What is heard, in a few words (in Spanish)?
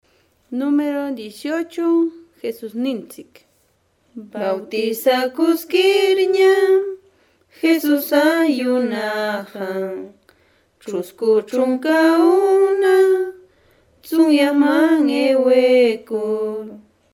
cancion